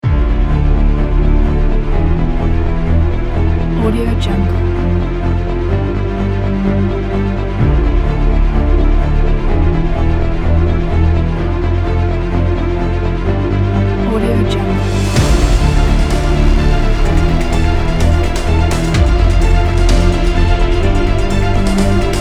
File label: cinematic action trailer